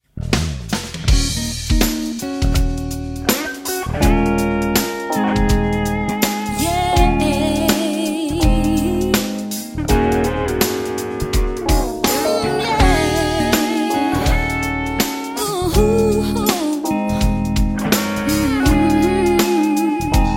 Rock Pop Band; Surrey

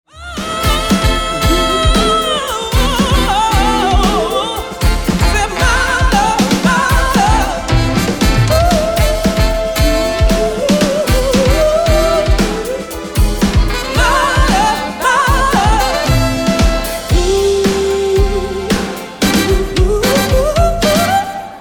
Soul Band; Surrey

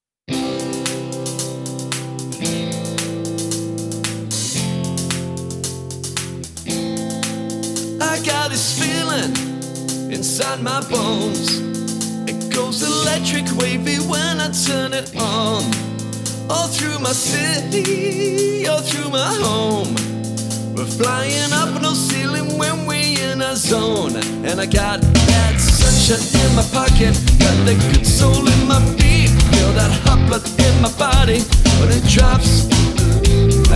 Rock Pop Band; West Midlands

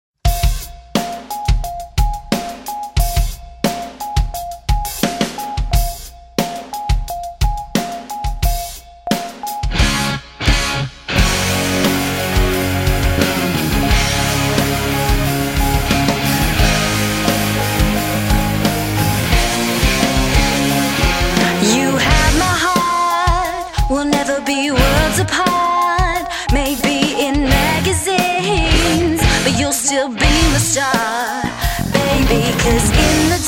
Rock Pop Band; Surrey

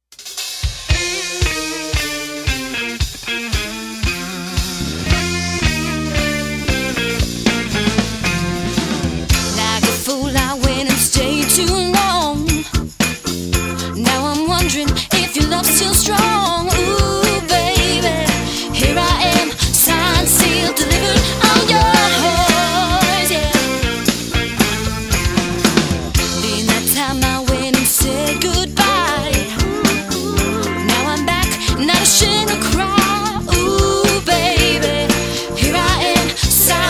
Rock Pop Band; Surrey

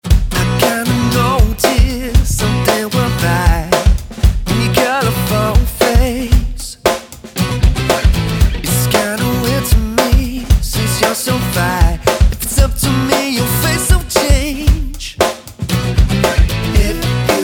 Rock Pop Band; West Sussex

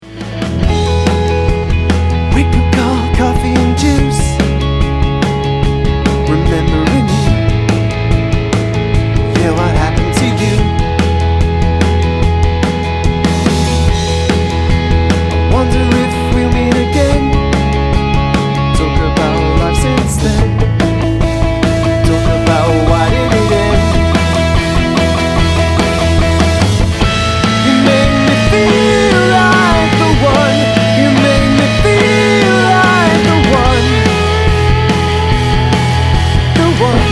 Rock Pop Band; Somerset

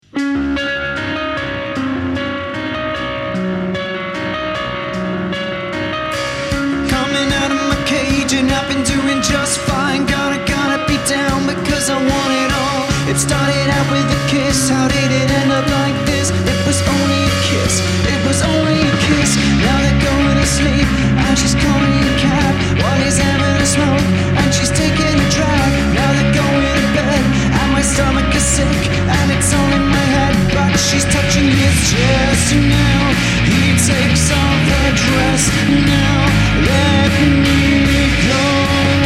Rock Pop Party Band; Somerset

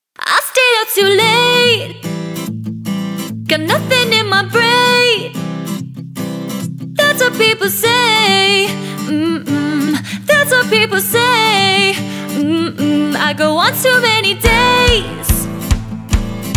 Rock Pop Band; Hampshire

